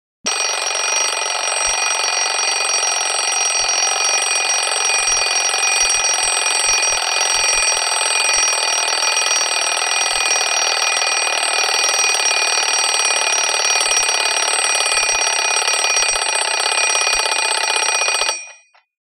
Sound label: Alarm Clock Ringing; clock chimes rings